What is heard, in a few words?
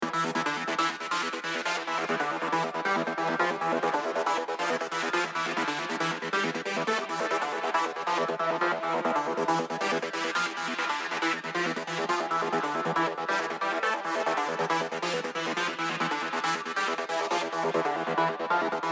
Melody